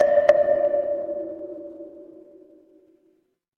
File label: instrument wood block